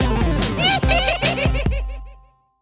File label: SFX Game Over; Adventure Time Game Wizard Draw Your Own Adventure